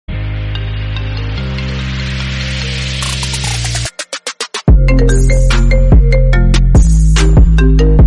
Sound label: I phone trap remix